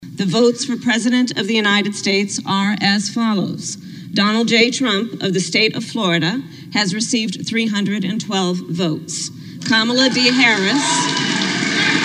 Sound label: interupted by applause; kamala; at trump electoral count